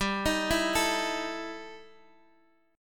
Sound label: E Minor Major 7th Double Flat 5th, G